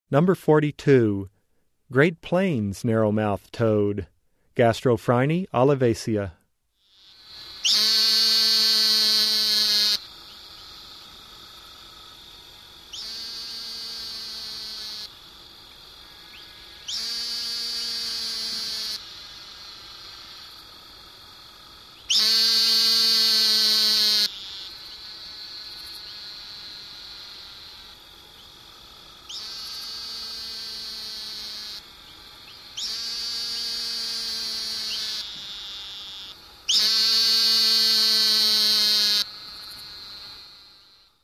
Gastrophryne olivacea - Great Plains Narrowmouth Toad, Frog Calls; Herpetology; Bio227 - Herpetology